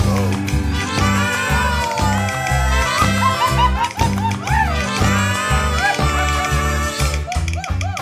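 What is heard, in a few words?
food world music inferno suspense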